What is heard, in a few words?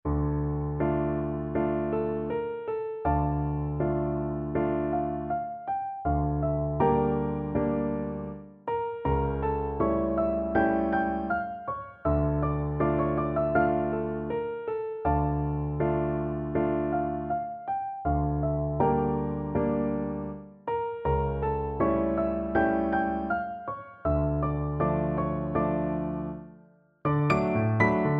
piano, piano duet